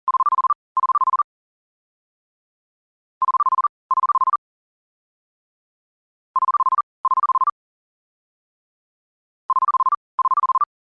Technology, Cell Phone Ring Tone; Electronics; Rings

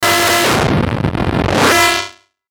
Sweeper Metallic Distortion Warp; Production Element Imaging Element Accent Transition; Sweeper Sound Effects

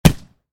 Volleyball (Exterior): Block Hard; Miscellaneous Sports; Stuart Duffield

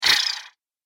Flutter Vibrating Swipe; Whoosh Sound Effects; Andrew Fleming

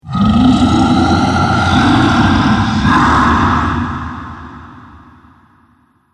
Zombie - Malevolent; Zomby Sound Effects; horror sound effects

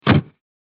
Sturdy Door Shut; Foley Sound Effect

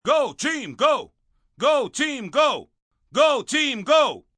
Cheer Go Team Go, Cheering, male; Crowd Sound Effects

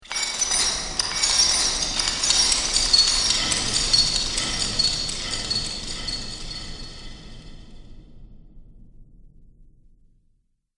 Metal Chains Magic Ambience

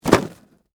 Foley, Cardboard, Box, Drop, Set, Down, Full

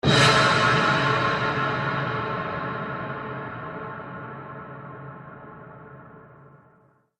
Epic Impact; Miscellaneous Impact Sound Effects

